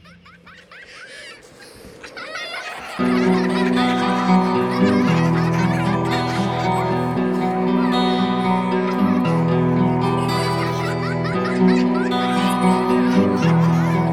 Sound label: prelude instrumental